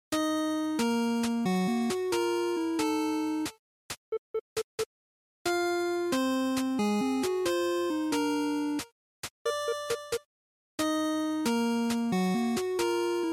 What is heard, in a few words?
NES Action Stage